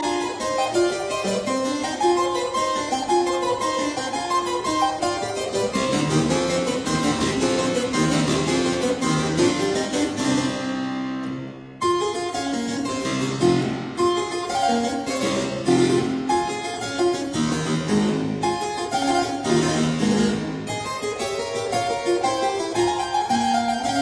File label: Keyboard Sonata in F minor